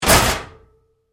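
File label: Door Locker Close Close Up Medium, Metal Door; Doors; Big Room Sound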